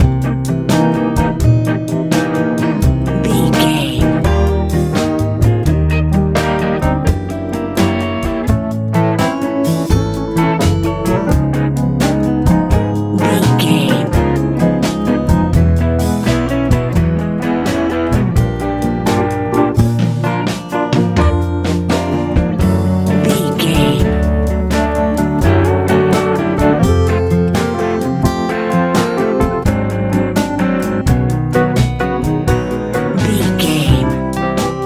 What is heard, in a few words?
colorful nice 50s 60s pop